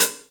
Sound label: Hihat Pedal WarmAndLow; Drum Hits; Standard Hits; Ableton; Factory Packs; Session Drums Club